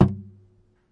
chassis hit, Typewriter

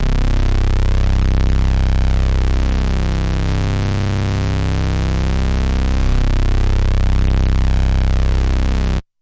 mini moog low c

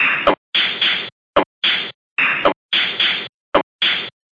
chh loop; Ze Franks Pain Pack

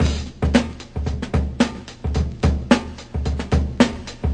melodic kick lofi, 112 bpm

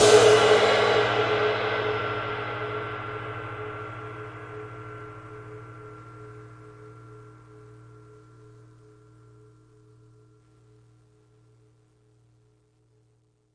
big ride crash; single hits; Big Believable Rides

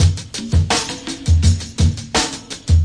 classic break, DRUM LOOPS and BREAKS; VIBRIS CLASSIC BREAKS

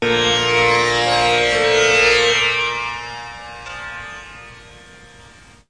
tampoura; World Music

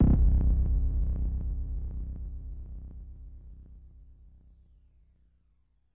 kick, drums single hits; iElectribe Kicks

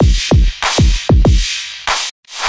strange hardkick verb beat, 96 bpm; 091 to 100 bpm

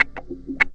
100 bpm guitar wakka; 091 to 100 bpm

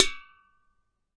Drinks Can, Found Percussion Hits; ModeAudio Found Percussion Hits

